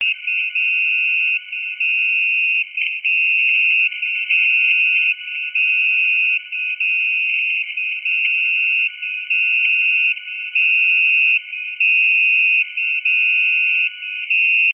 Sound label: pactor II fec